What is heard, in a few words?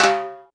Timbali Flam; Sonic Foundry (Sony Creative Software); Joe Vitale Latin Percussion